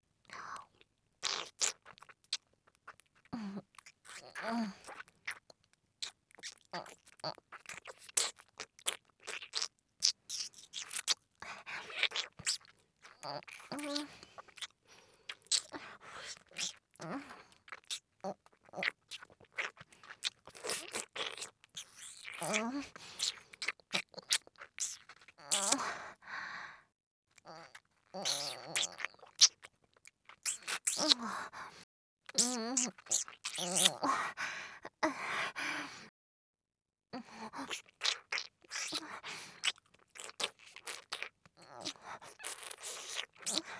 ちゅば音, ASMR; リナ; ＳＥなし

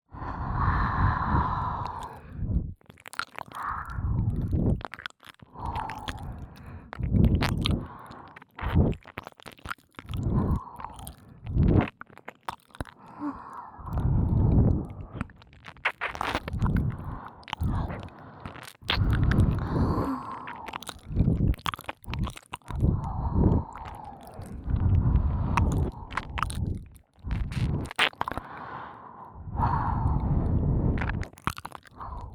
ハムハム耳舐め両耳; 耳舐めコレクション

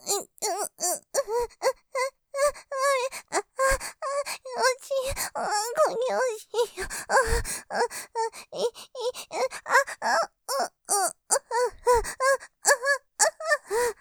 喘ぎ 声がつまっちゃう; おまんこ気持ちいい; ループで楽しむ！シェフの気まぐれ抜き抜きコース; モノラル

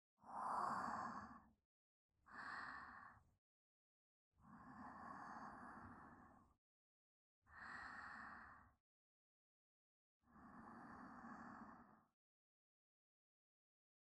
寝息（ループ）; 結の寝息

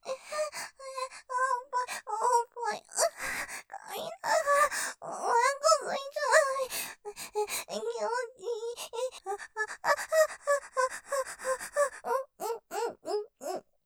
喘ぎ おっぱいいじられながら・感じちゃうやばい, ASMR; ステレオ; ループで楽しむ！シェフの気まぐれ抜き抜きコース

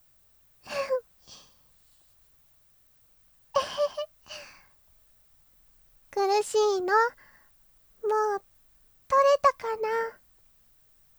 耳舐め えへへ締め; ケモノ仕様

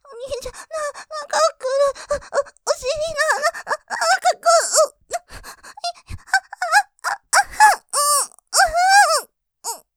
絶頂スパート; お兄ちゃん、なんかくる！！絶頂！; ループで楽しむ！シェフの気まぐれ抜き抜きコース; モノラル

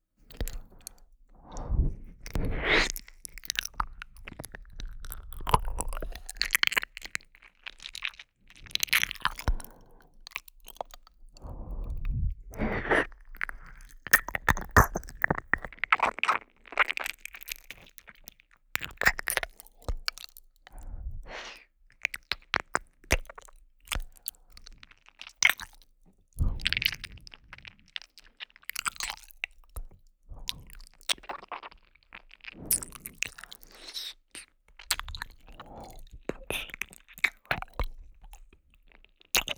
唾液たっぷり耳舐め

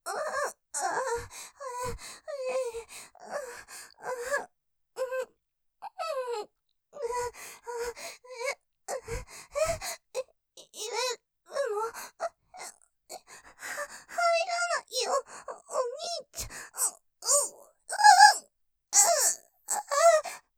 喘ぎ; さらに体が反応して濡れてきちゃう→挿入寸前！; ループで楽しむ！シェフの気まぐれ抜き抜きコース

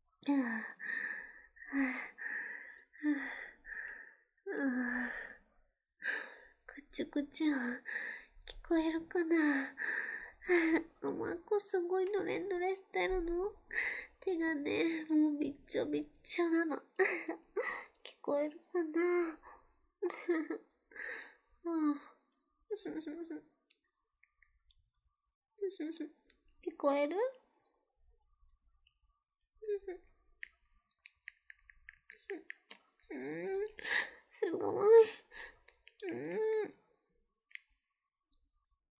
くちゅくちゅ音; １の余韻オナニー; くちゅくちゅ音聞こえる?; オナニー実演音声

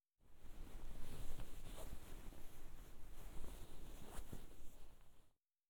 双子が同時に耳かき（両耳）ASMR

